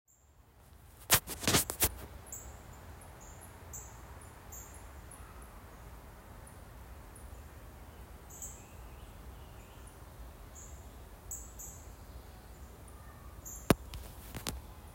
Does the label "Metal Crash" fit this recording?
No